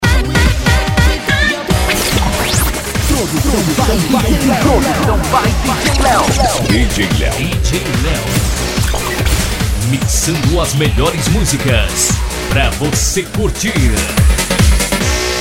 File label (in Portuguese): electro funk e electro dance remix